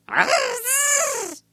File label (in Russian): zvuki cherviakov